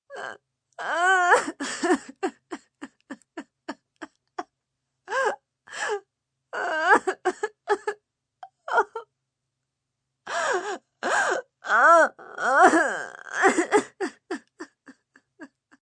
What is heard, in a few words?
Cry Desperate, Cry, female, desperate with gasps, pain, hurt, sad (human voice); Crying Sound Effects